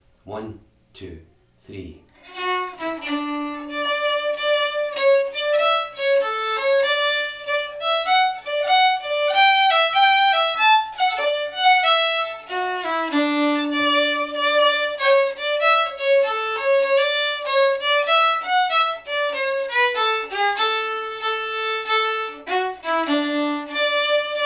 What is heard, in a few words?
Lochmaben Hornpipe; slow jam